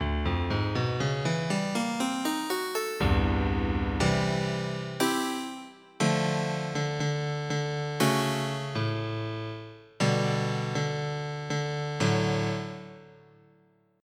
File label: Bright Acoustic Pian; FF7